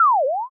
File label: slip